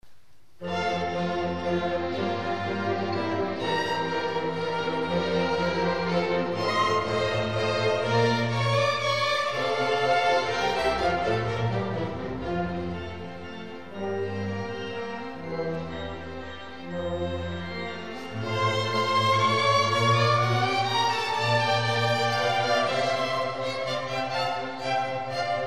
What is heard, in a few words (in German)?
Pfarrorchester Mozart Kirchensonate